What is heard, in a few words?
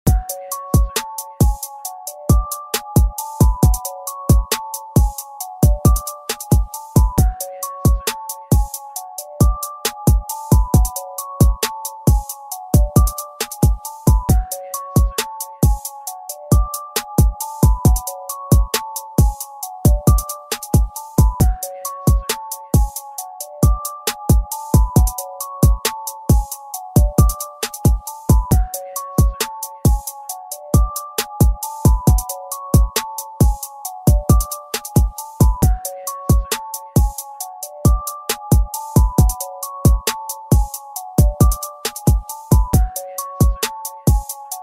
Instrumental